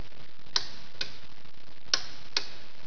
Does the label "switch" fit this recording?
Yes